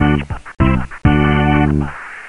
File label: Mission Completed; Pizza Hot